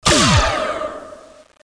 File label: Magic; duke nukem total meltdown